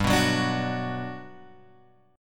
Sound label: G Major 7th, G, down, Guitar, Open G